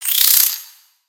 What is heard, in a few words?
Spider Exert; creatures mechanical; Lego